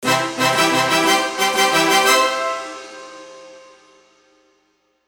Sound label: fanfare